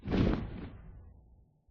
flap, phantom; mob; Minecraft

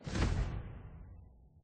flap, phantom; mob; Minecraft

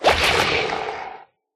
convert, drowned; mob; Minecraft

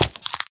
jump egg, turtle; mob; Minecraft

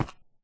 stone, step; Minecraft

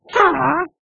ambient, witch; Minecraft

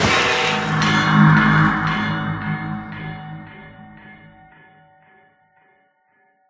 thunder, trident; Minecraft

